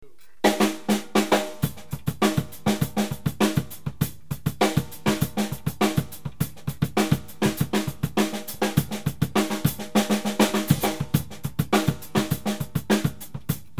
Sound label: amen break beat